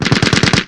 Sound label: mchgun; PC Gamer IT CD 32